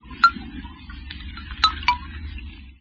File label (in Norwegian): laks